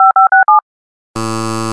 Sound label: digicode refused; scifi nodes